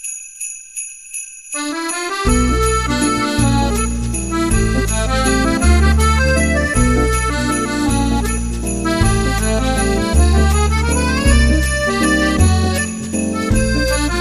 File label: Waltz With Sleigh Bells